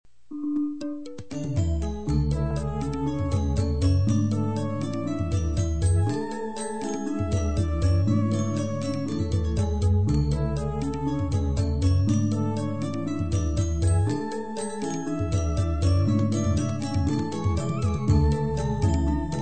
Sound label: degong